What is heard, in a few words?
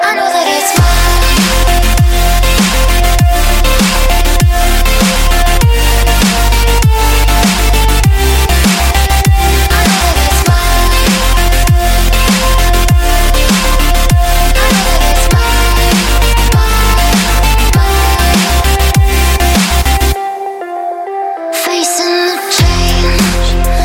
Chillstep